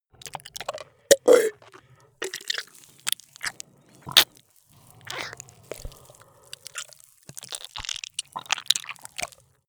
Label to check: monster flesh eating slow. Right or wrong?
Right